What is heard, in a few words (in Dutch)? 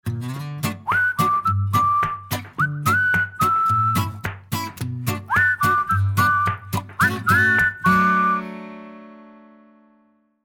Jingle kort